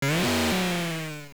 Boss Scream Distant, Dungeon bosses screaming (distant); LOZFDS